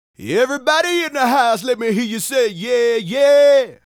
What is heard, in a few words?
Male Solo, Hip-Hop and RnB Vocals